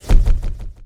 Menu Fail Error; Menu FX

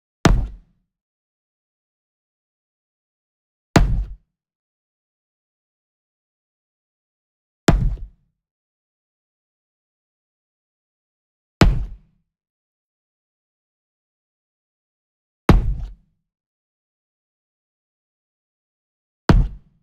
Sweetener Wood Box Hammer Scrape Hit Near; boom library; Cinematic Strikes Construction Kit

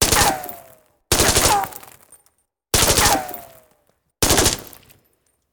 Bullet Ricochet; Sound Ideas; Guns

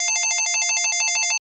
phone ringtone; NS Studios - ultra sound collection

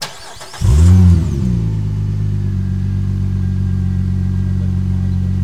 98 Vette Start Loop, Automobiles

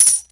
Pickup Coins; SFX Kit; Pickups

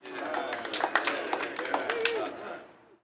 street ball crowd cheer low; Crowds

